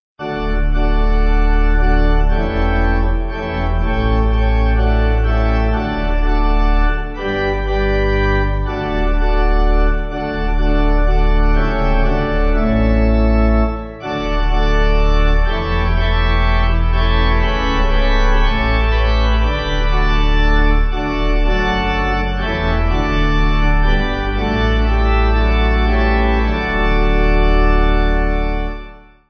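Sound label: Pipe, hymn backing; Not I But Christ; Bolton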